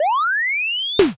defib

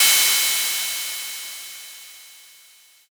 crash cymbal

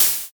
open hat

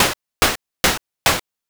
snow step

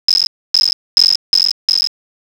insects

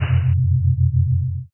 horror title

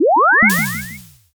warp jump

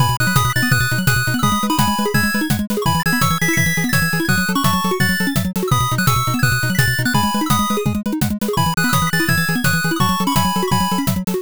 bgm drive

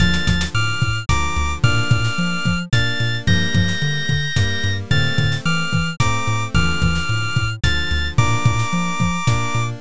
bgm

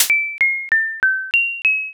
cracker

